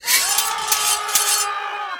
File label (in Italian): Forconi